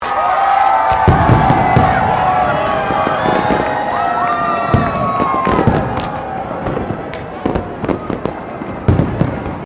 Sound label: AMBIENCE CROWD CHEER FIREWORKS; Nye Melbourne 2009; New Year's Eve 2008 Fireworks